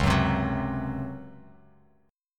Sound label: Db Major 7th Suspended 4th; 9,9,10,11; strummed; chord shape